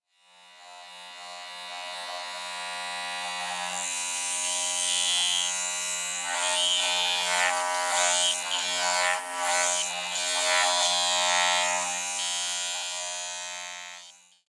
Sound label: Barber razor haircut electric hair clipper